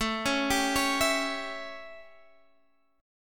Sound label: A 7th Guitar Standard, chord; arpeggiated; JGuitar